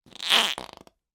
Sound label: human fart high pitched comical